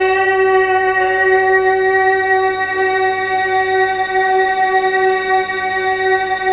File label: MALE EE F#3, SOLO, Africa; Spectrasonics; Heart of Africa 1